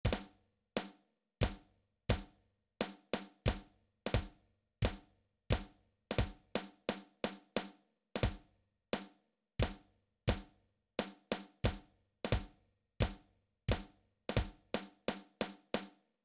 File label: Slippery Slurs, drums